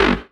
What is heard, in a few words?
303 Acid G; Vengeance Samples